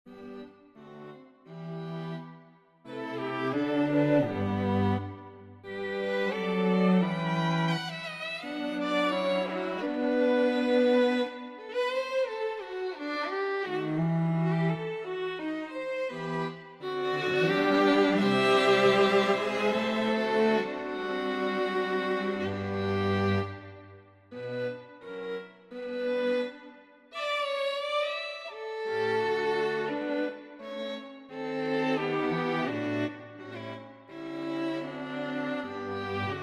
String Quartet Miniature

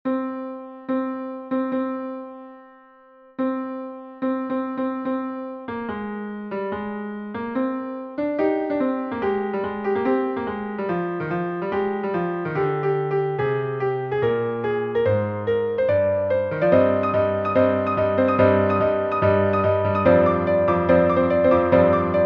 Prelude

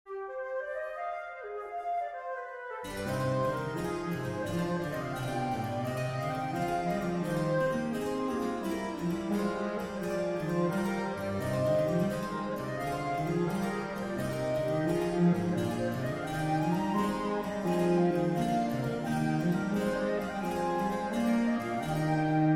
Allegro for Flute Sonata in c minor

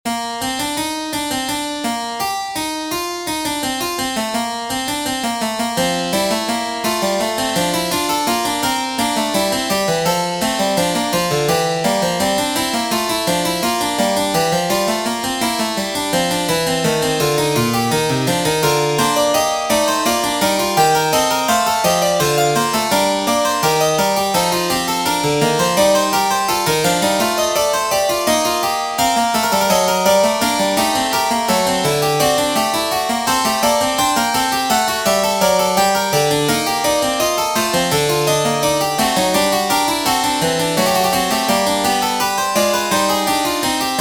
Fugue in B flat major

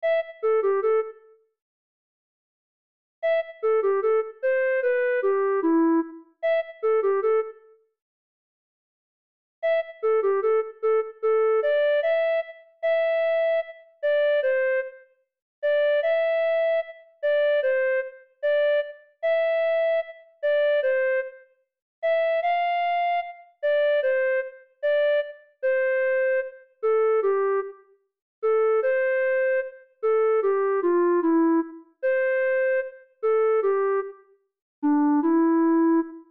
Waterlily Sonatina, for Flute

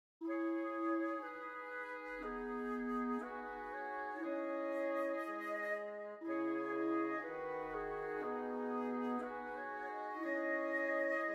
Menuet in C sharp minor orchestration midi; Ravel orchestration; extended version

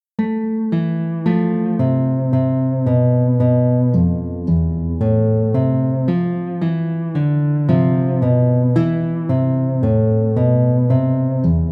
Soliloquy for Guitar

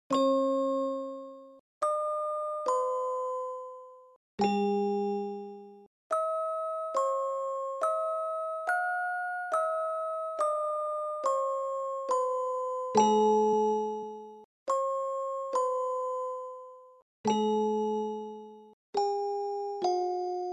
horror

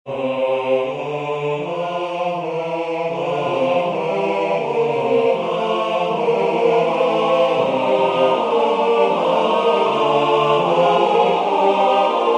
Canon Polytonale Bar 4 Seventh; polytonal 4 voice canon

